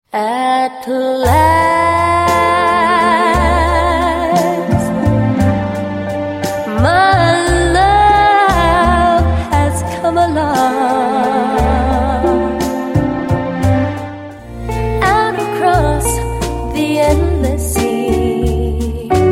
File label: Latin Band Jazz